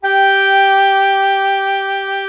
WINDSEC G4, WIND SECTION; Greytsounds VOL-1

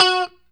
STRAT POP F#4, Guitars; Spectrasonics; Hans Zimmer Guitars VOL-1; JC-STRAT POP

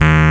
D RHODES D#, Road Key Bass

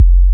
Thunderdrum, drum machine

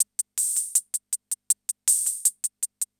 CR78; Best Service; XX Large Most Wanted

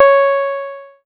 C#, PIANO; Big Fish Audio; Synclav FM

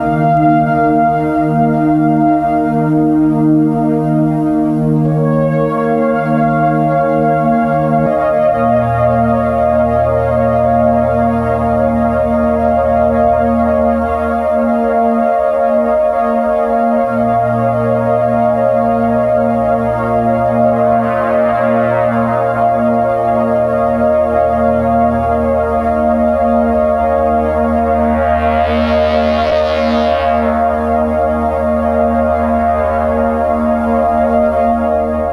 Analog Dream Bed, Synth Sequences

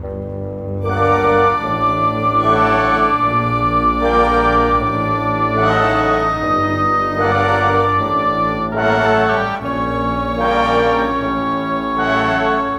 Cinematic Orchestra

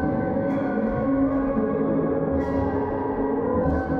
Tonal Re Draw, sparse soundscape

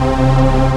STACK; AKAI S6000 CD-ROM - Volume 1; SAMPLE WAVE MIXING 2

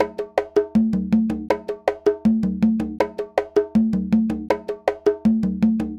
Conga, Latin Percussion

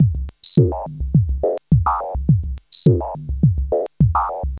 SYSTEM 100; AMG - Vince Clarke Lucky Bastard

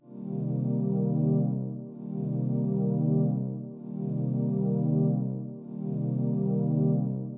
130 C trance kit; Trance Explosion Vol1

